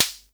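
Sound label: SR95 snare drum; Best Service; Dance Mega Drums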